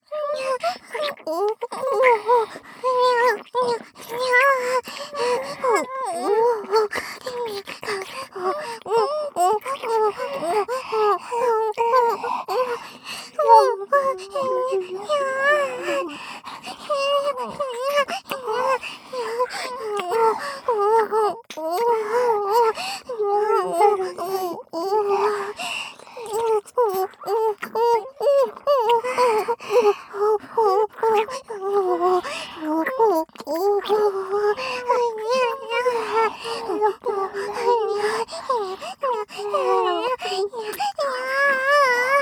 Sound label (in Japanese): ディープキス＋オホ喘ぎループ, ASMR